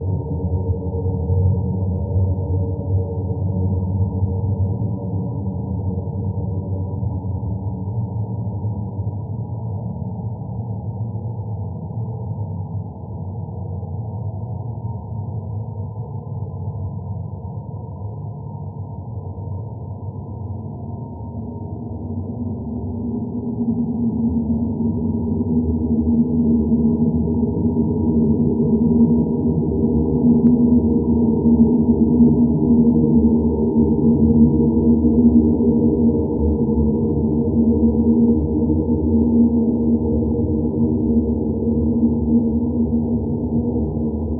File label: scary ambience